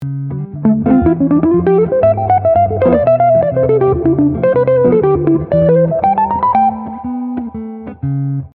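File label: Lick, Jazz Fusion; Guitar Club Dicembre 2024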